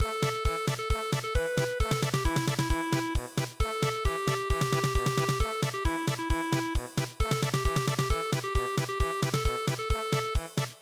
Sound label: polka; Genkotsu